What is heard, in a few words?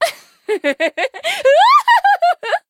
laugh; MissFortune; jp